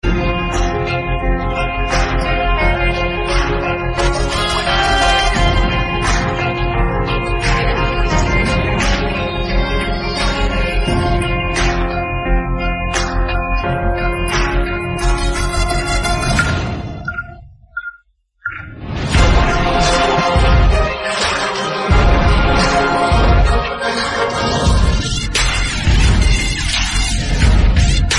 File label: Tamil Bgm Ringtone; Meesaya Murukku